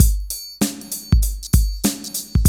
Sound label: Loop, Roland; Sound & Vision - Gigapack I